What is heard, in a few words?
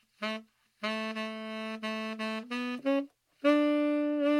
tenor first phrase saxophone